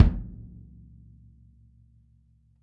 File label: kick